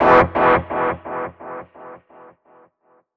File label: Guitar Chords E; Zero-G - Future Wave